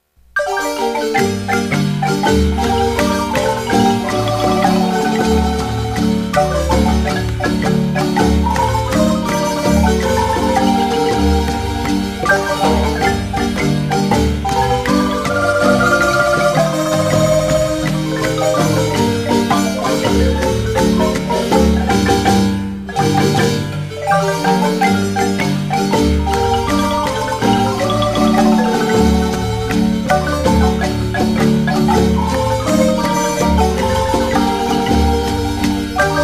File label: marimba; El danzon de la Pianguita; musica guanacasteca